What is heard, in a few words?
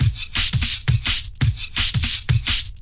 170 bpm swing stepper, drum loop